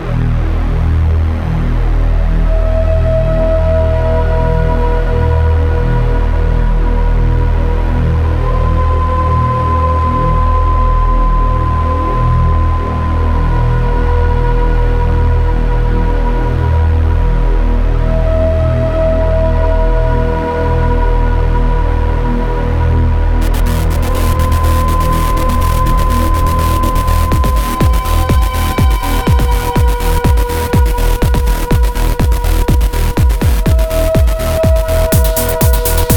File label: trance